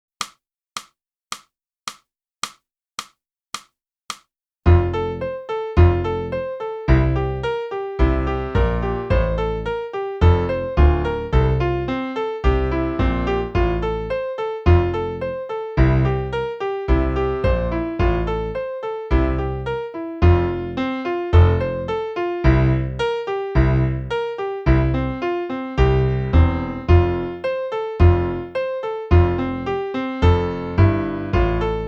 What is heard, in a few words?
flute; Boosey Woodwind Method: Flute (Book 1)